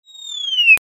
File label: Chirp; Animal